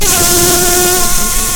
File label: Lapidarius queens, bumblebees